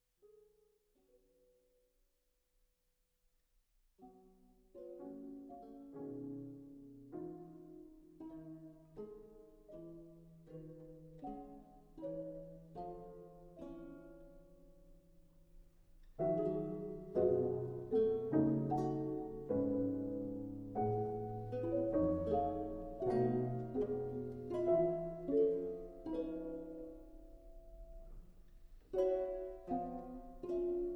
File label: low dynamic